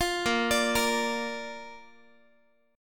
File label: A sharp Major, Guitar; arpeggiated; tuning 4A,4E,5A,5D,5G#,6C#